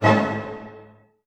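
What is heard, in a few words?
D# major pianissimo, orchestra hits; Sound On Sound - Peter Siedleczek's Orchestra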